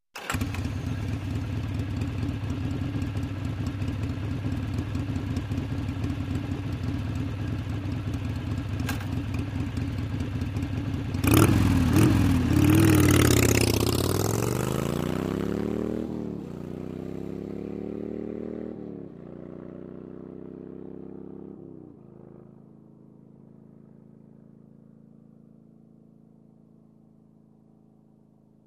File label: Motorcycle starts, waits, drives away